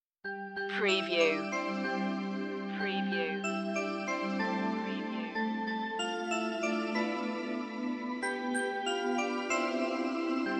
bells keys only; jingle bells

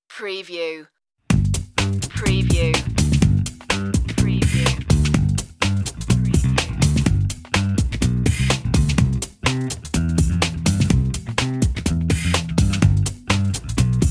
just bass

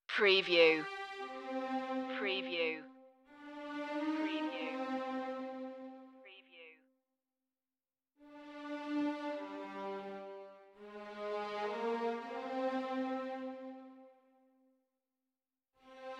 strings only

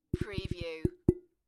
Tapping on a Glass Lampshade; beanstalk audio

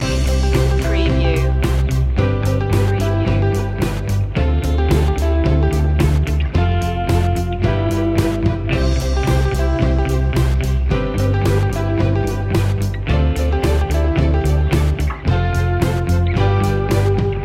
winners attitude loop

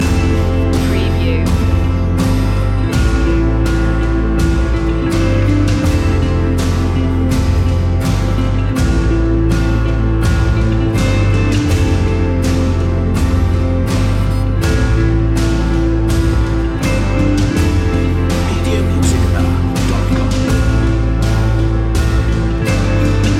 falling down loop

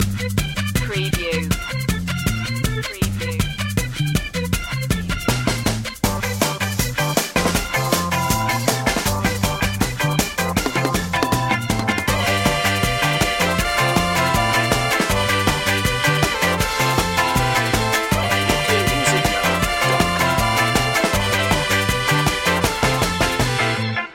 happy ska

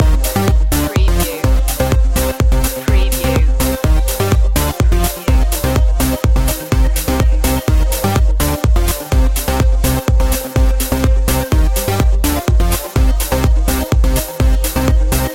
swedish trance loop

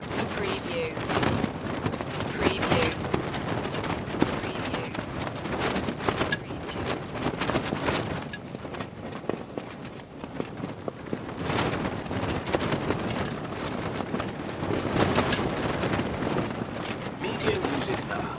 flapping in the wind

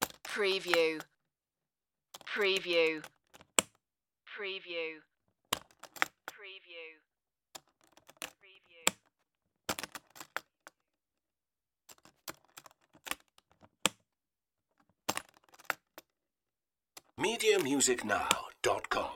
aluminium suitcase

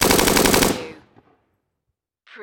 Machine Gun Bren

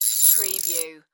Ratchet Turn Loosen

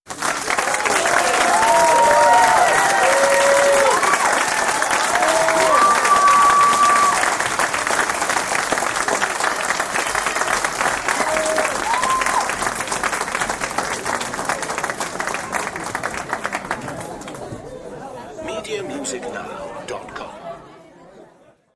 Audience Applause; beanstalk audio

